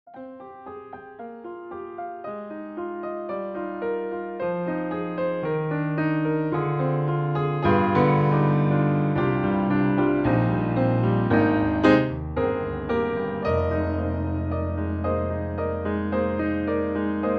steinwey bright, piano

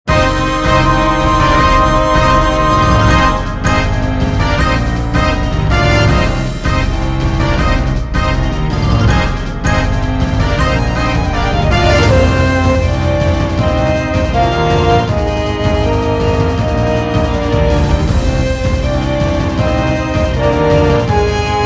Battle

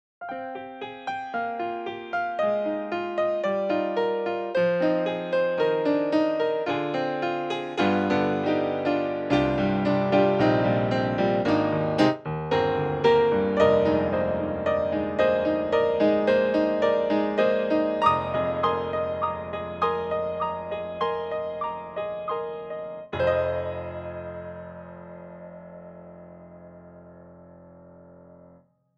boesen off

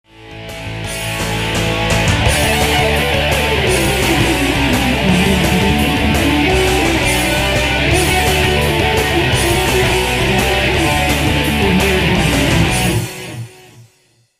solo, guitar instrumental musics

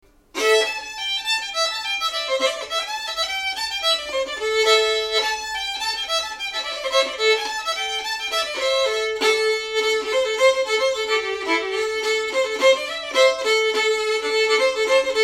Cluck Old Hen, fiddler

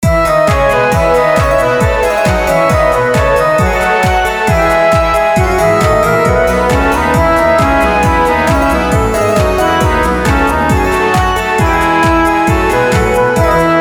Rhythm

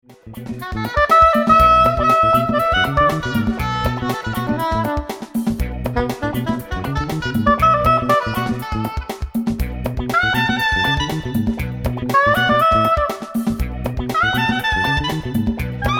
Funk Sop

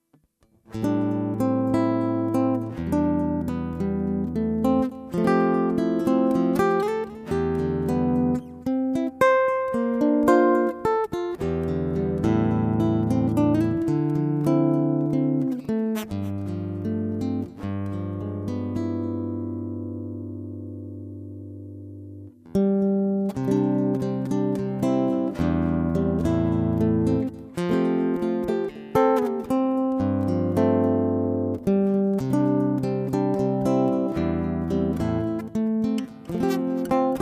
g solo

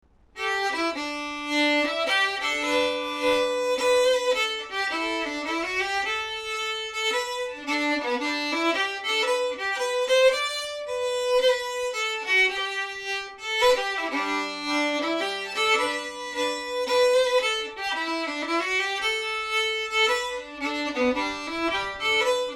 Waltz

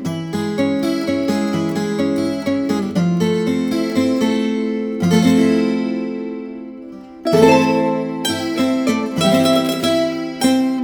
cristal

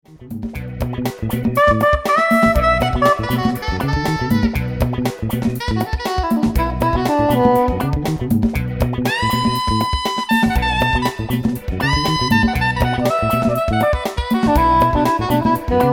Funk sop; Marmaduke

